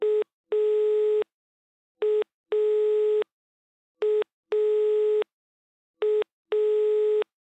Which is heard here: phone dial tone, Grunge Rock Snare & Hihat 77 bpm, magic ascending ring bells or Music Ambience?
phone dial tone